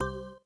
Symbol Hilight; fruitblaze; netent

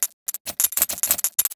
special reel flash; netent

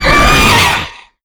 Grunt Hit Heavy; netent

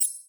sparks wincountup End; netent

